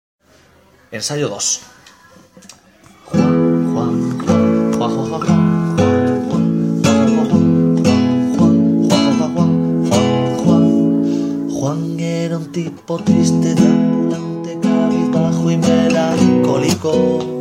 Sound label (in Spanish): canta y toca guitarra